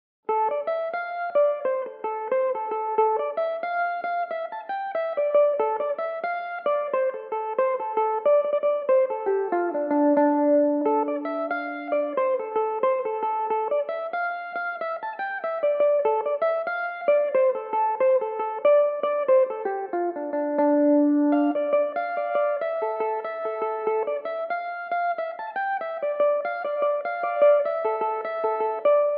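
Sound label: D minor, Irish mandolin; Belmuse EM-190 electric mandolin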